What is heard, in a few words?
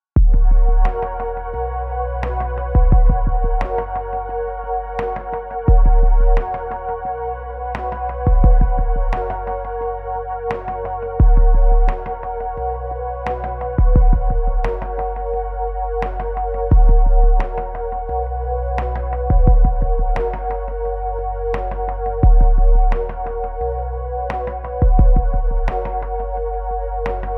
Backing track full without brass